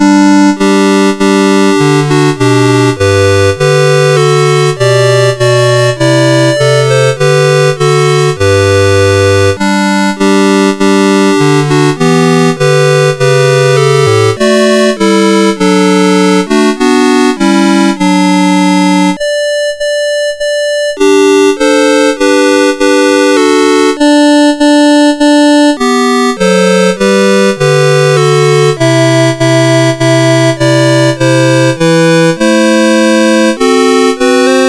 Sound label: Hark the Herald Angels Sing, CSG8580R5; Hark the Herald Angels Sing B